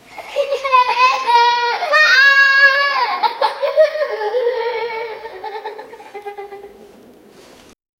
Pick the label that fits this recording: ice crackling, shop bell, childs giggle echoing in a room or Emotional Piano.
childs giggle echoing in a room